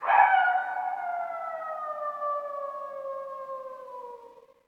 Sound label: Dog Night, alarms; LG Qua tab PZ LGT32